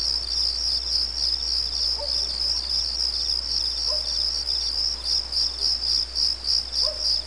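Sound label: background music; Virtual Graceland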